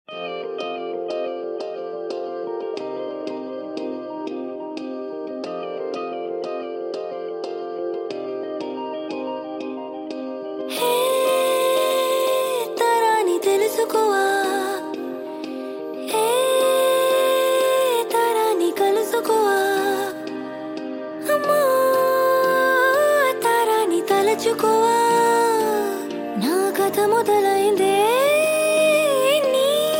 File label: female vocals